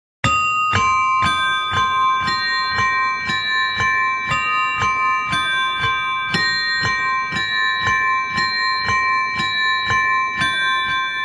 Spooky Bells

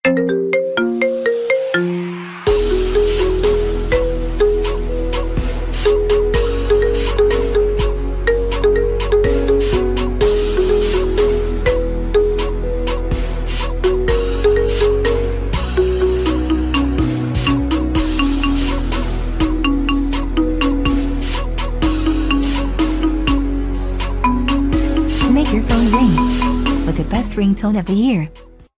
Marimba

